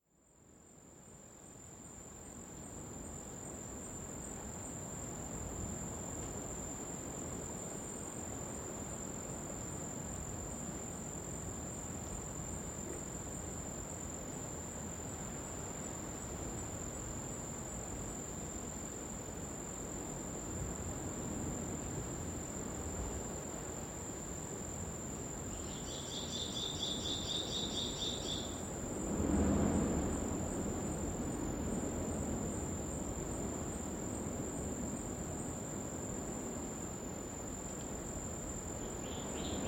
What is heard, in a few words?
birds in a coastal forest